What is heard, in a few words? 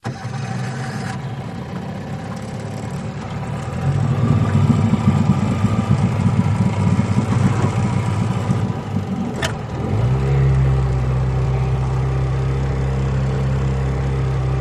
50 hp Evinrude Boat Away Loop Start, Drive